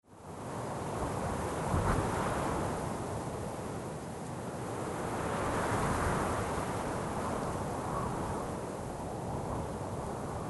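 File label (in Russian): ветер пустыни; pustynya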